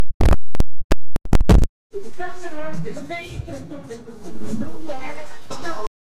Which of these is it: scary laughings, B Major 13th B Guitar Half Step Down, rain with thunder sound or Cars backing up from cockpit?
scary laughings